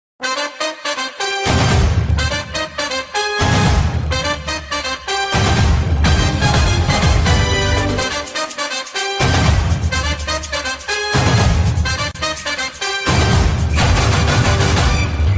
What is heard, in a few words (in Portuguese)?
vinheta de gol; fox sports; memes